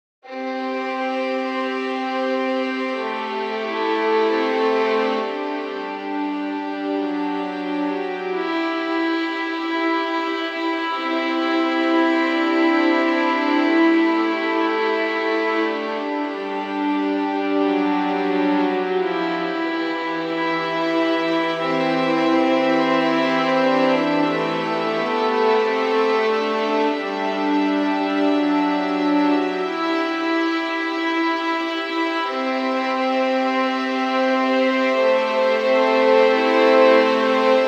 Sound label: Tranquil Nocturne; admiralbob77